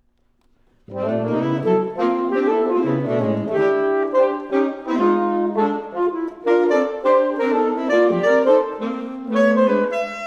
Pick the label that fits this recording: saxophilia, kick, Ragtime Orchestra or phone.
saxophilia